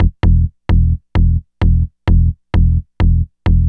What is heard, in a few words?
Synth Bass